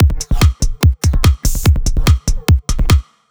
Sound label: 145 Fill, Drum Loops; Zero-G; Soundclash Nu Dance Electro; Acidized Wavs; 145bpm; Buzzing Fly